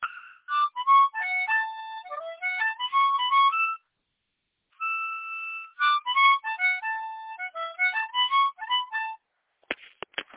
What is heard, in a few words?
short harp lick